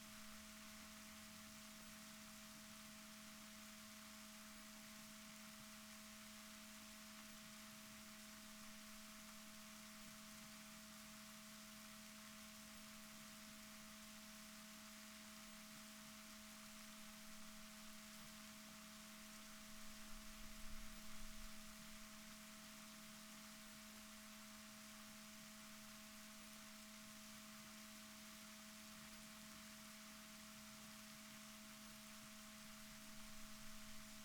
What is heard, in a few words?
rorquals negatif, Balaenoptera Physalus Chile